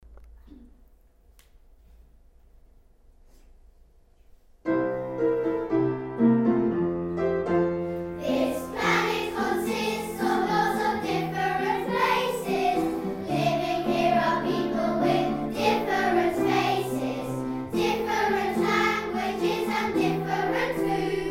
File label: choir